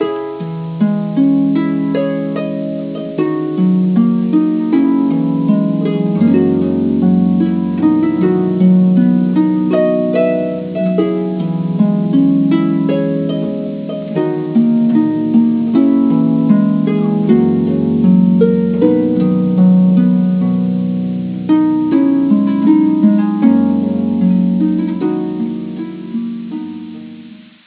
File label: harp